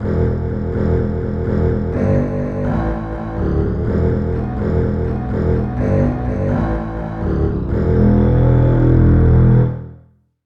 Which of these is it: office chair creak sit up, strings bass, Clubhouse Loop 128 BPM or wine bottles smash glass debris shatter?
strings bass